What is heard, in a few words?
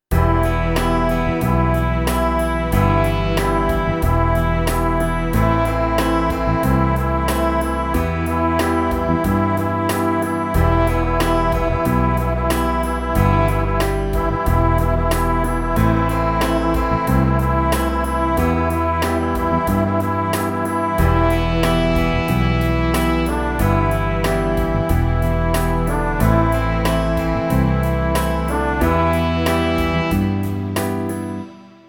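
Big Ballad in Em, Brass Melody 92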